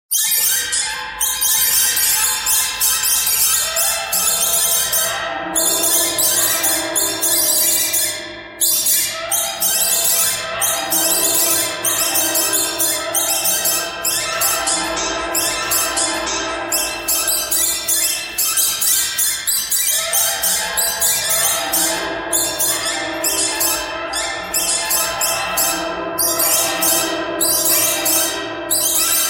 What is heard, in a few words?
birds thread pentatonic